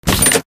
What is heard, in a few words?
quick Stop; netent